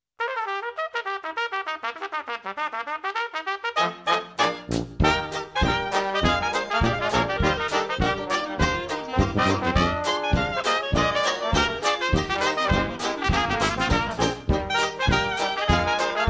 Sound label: Cornet